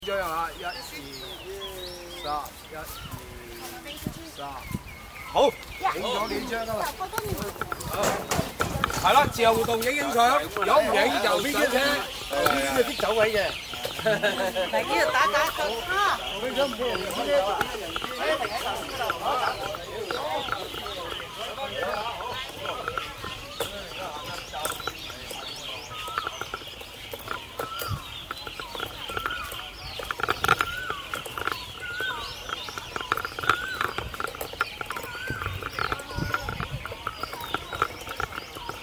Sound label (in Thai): เสียงบรรยากาศวัดมหาธาตุ; พิพิธภัณฑสถานแห่งชาติ